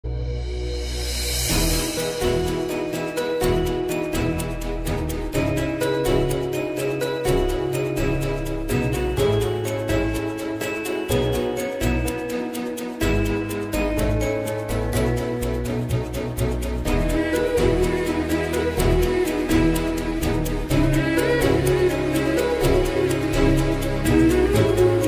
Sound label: tamil heart touching ringtone; paiya